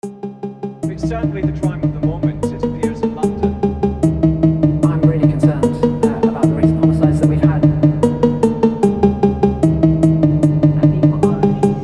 Happy Phonk

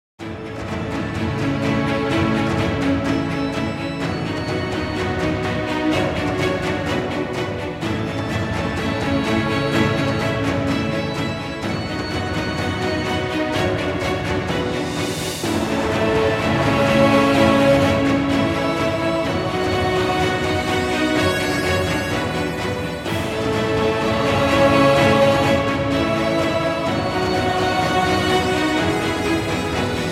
Sport march, Epic Music

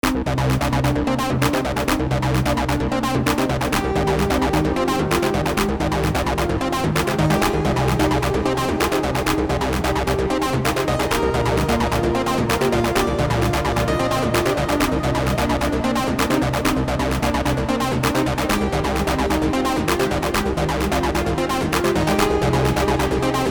Acid-Trance

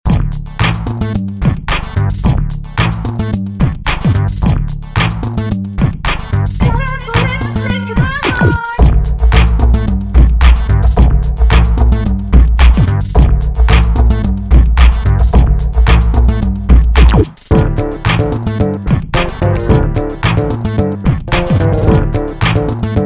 Baby Beat

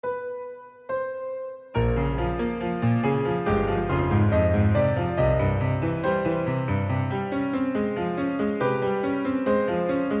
Piano

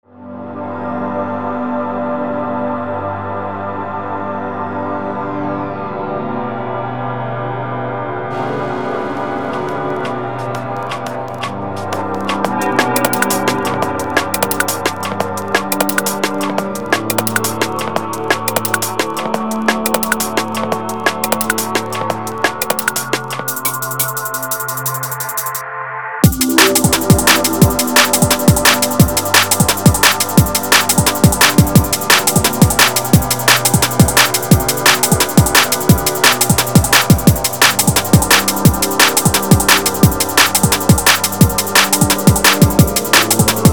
Liquid soul vibes

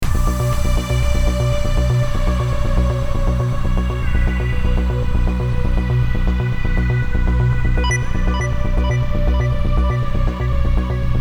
Ambience in the air; 90 degree up

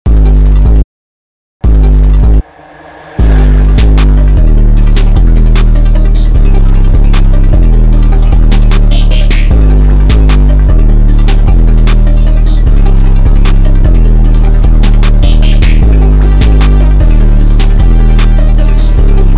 152bpm

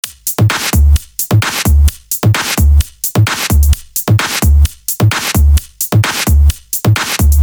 Percussion

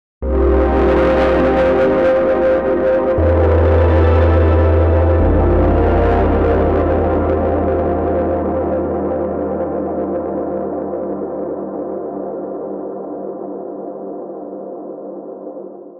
Silent Hill Pads

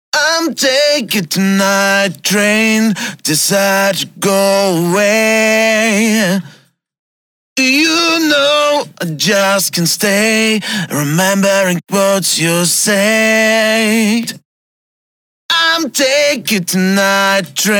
Acapella

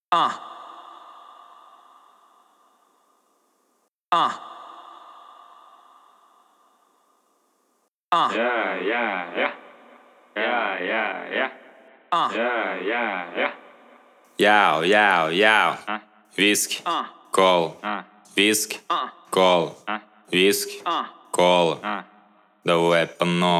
Acapella

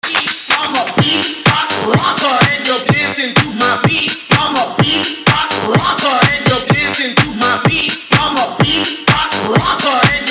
Radio Mix

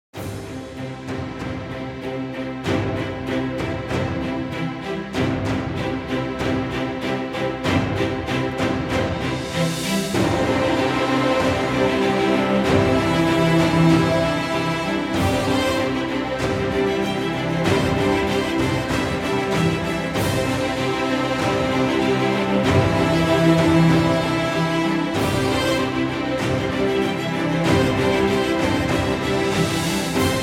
Sport Energetic Epic Music

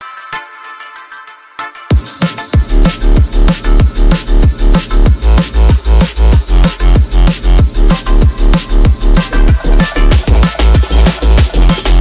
horns. twin.

